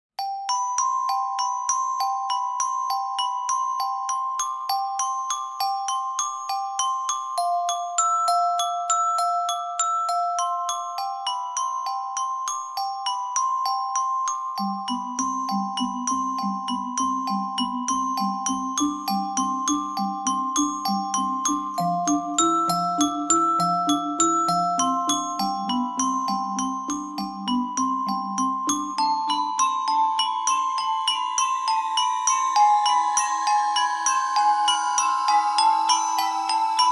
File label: glockenspiel